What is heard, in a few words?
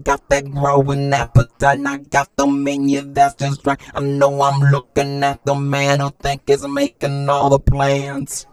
ROBOTRAP, funky vocals, rap ragga; USB Soundscan vol.18; AKAI